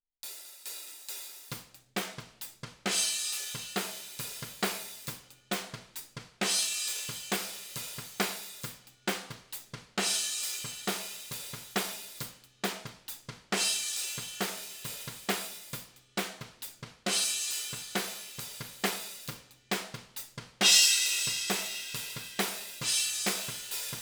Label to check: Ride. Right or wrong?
Right